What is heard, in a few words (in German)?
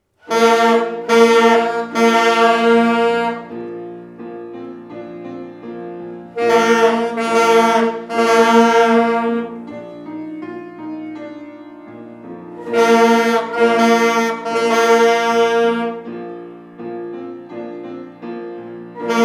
Erste Töne Tutti und Solo Saxophone und Querflöten; Klasse 5